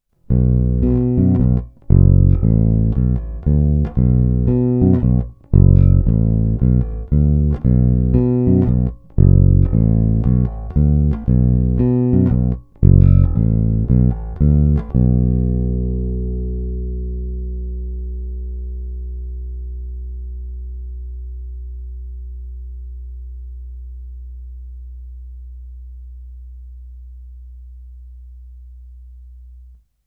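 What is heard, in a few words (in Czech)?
Snímač u krku, Jazz Bass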